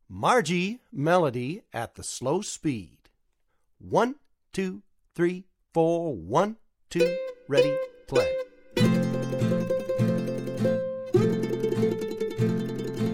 mand mel slow

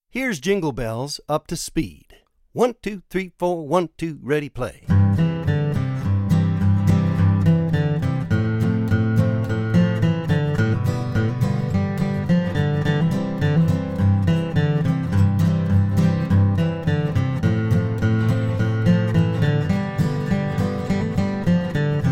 Jingle Bells

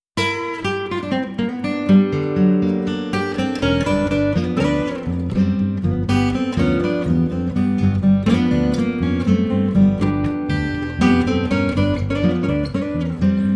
Tuxedo Blues

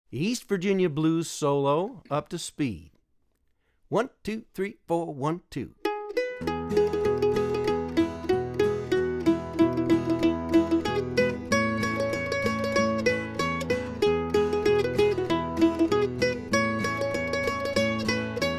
Blues

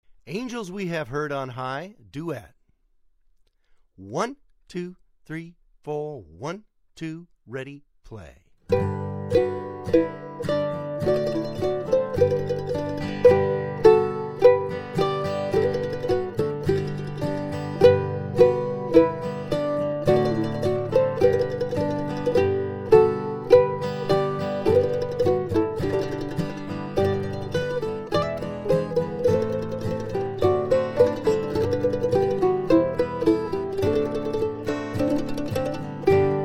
mando duet

